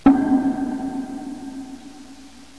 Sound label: hollow drum